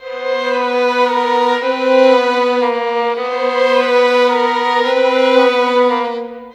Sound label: Violins, Cinematic; Sonic Foundry (Sony Creative Software); Orchestral Series VOL-3; Cinematic 17